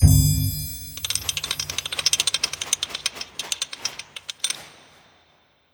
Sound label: year rewind